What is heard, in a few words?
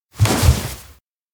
step back; anemoi